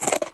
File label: hero eating